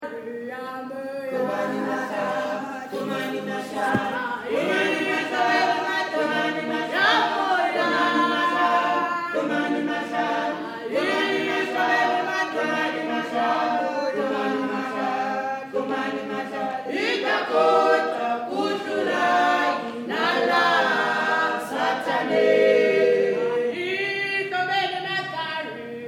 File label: Acapella African Choir